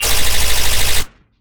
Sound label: future weapons submachine gun burst; future weapons 3; soundmorph